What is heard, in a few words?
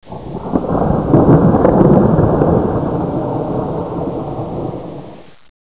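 thunder far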